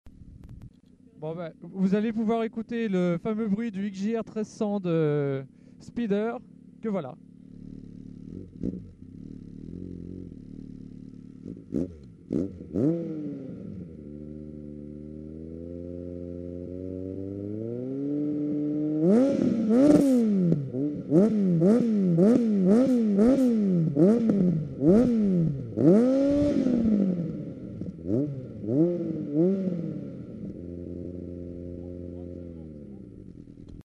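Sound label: DEVIL OVO FULL POWER, Yamaha XJR exhaust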